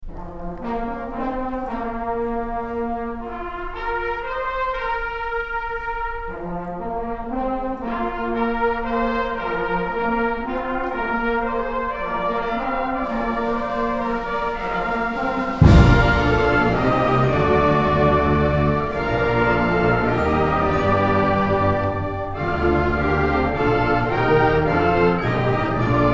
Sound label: Flourish for Wind Band